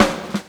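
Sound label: drum roll; E-Lab; X Static Goldmine 2; akai; ROLLS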